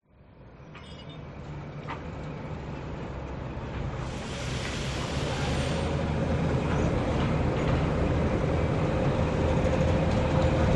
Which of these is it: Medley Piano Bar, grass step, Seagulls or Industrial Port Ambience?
Industrial Port Ambience